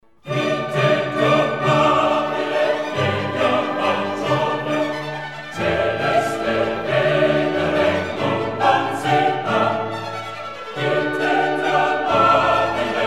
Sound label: Coro; Mozart; Ascanio in Alba; Di te piu amabile ne Dea maggiore